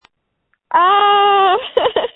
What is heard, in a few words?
screams